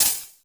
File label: EFX HAT, effected drum; Crypton - DrumBase5000